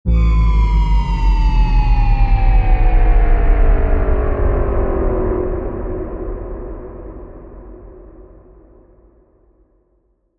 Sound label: Subtle SciFi Drop